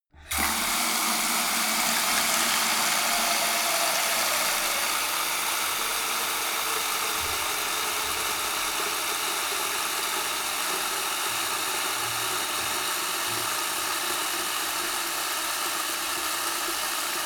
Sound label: sink fill hard long